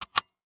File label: Technology Electronic Camcorder Panasonic Dvx100 Placing Tape In Tape Deck